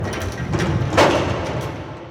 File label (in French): Poignée ouvrir; Feed The Beast; Bowl